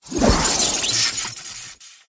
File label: tiger